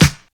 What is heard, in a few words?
studio acoustic snare d sharp key, Royality free snare single hit tuned to the D# note; snare drum samples